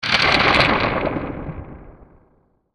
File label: element thunder; maoudamashii